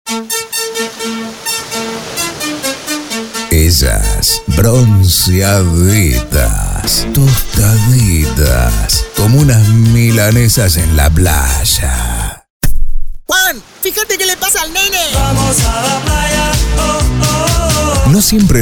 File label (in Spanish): Urbano